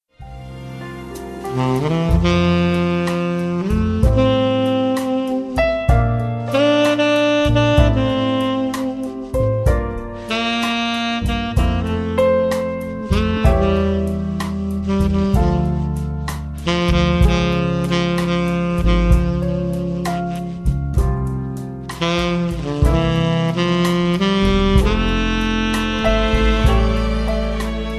Jazz And Strings

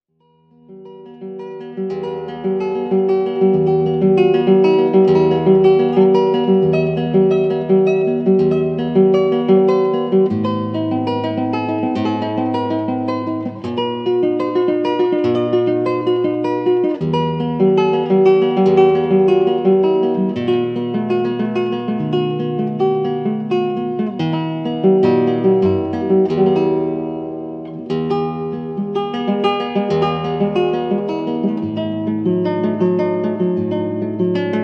Spanish Ballad, Guitar; Romanza; Anonymous

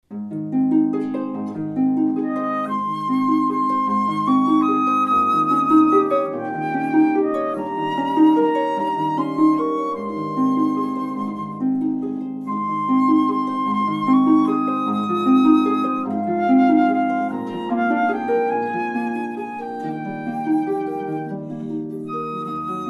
On Wings of Song Mendelssohn, harp

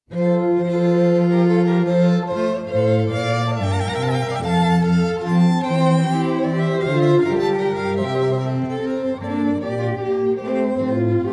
string quartet; Classical Quartet; Vivace from Corrente No.6 Corelli

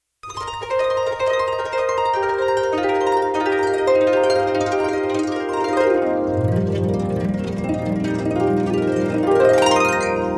Processional, Harp; Linda Wood

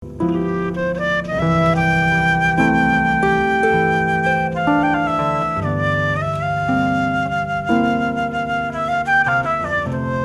Harp And Flute; Classical

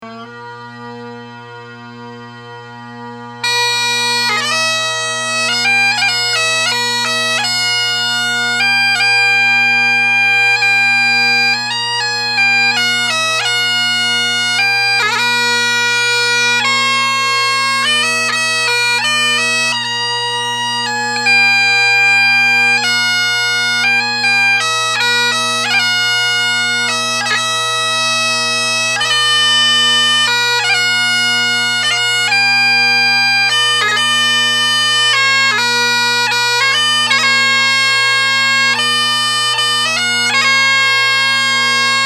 Bagpipes

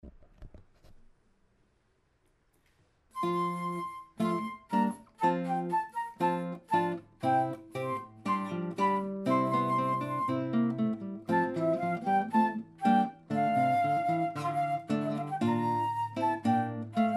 Renaissance song flute guitar; Classical Duo

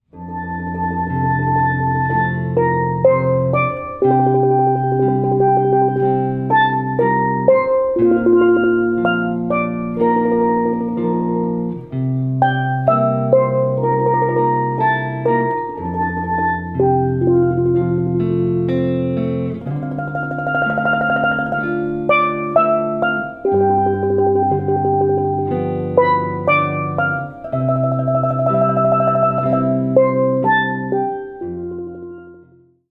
steel drum And guitar; Arioso; wedding

